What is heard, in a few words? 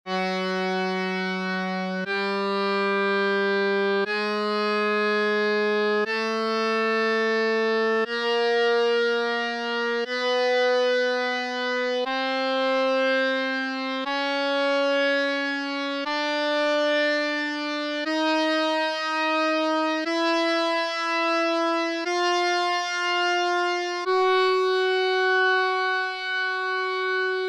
F sharp scale, harmonium; keyboards